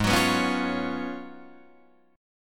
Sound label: Ab Minor 13th, Ab, Guitar, Drop D